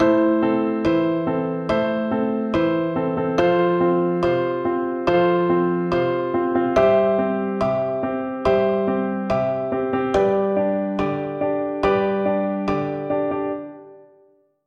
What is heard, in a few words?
acordes